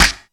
Clean Snare Single Hit F# Key, acoustic snare sound tuned to the F# note